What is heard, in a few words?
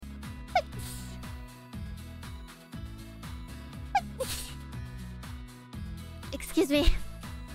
Sneeze